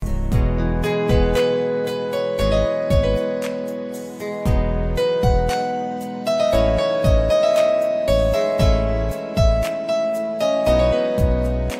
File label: heart broken piano ringtone